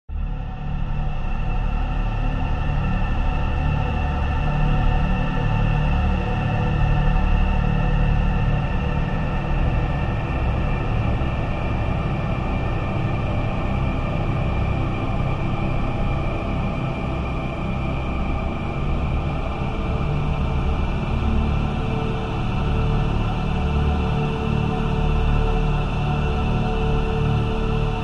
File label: science fiction atmospheric drone dark airy mysterious lightly wondrous